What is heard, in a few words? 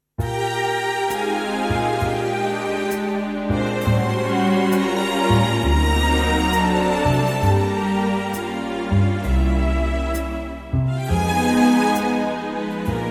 ballad Eb; key - Eb - vocal range - Bb to D; Livetrak version